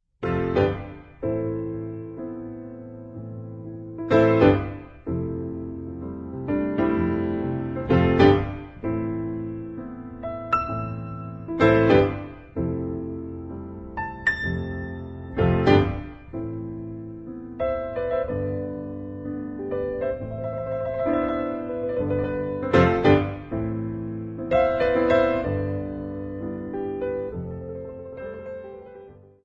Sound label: Naked Piano; Black Goose